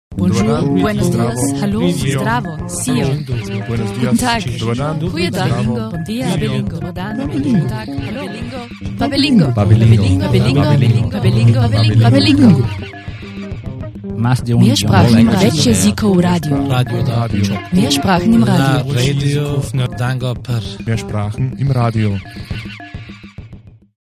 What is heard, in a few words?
jingle